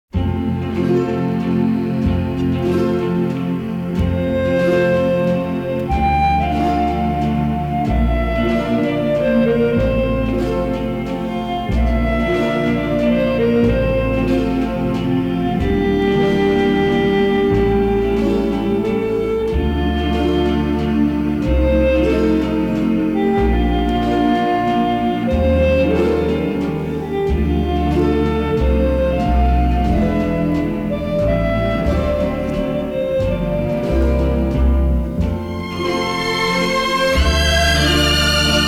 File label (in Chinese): 电影音乐; 奥斯卡电影音乐